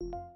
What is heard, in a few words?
error